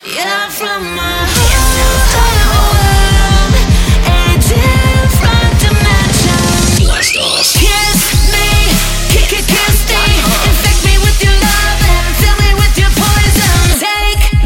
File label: Premade Dance Music Mix; Blast Off (Space Theme)